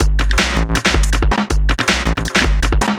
Beat, Drum N Bass; Sonic Foundry; Knutrix Drum & Bass

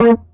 EFX SHRT Bb3, bass; Sampleheads; Ultra Freakin' Bass; EFXBASSSHORT

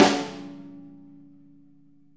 snare; Sonic Reality; Interactive Drum Kits

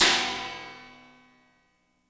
CHINA, HI-HATS; Spectrasonics; Burning Grooves

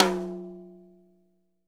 tom, drum

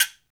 PRC SB Guiros, African Contemporary; Spectrasonics; Supreme Beats

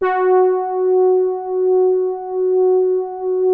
POLY SOFT F#4, SOFT BRASS; Music Store - Claudius Diemeter Schwerlos

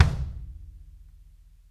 KICK

